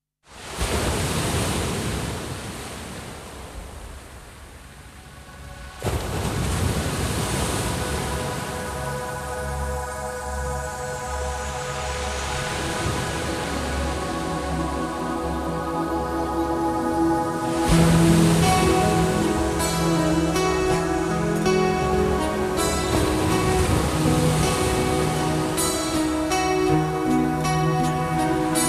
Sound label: Instrumental